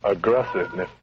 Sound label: aggress sniff